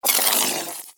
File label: Ball Held Spark; Warframe; Tenno Ball; Missions